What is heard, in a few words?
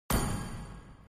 open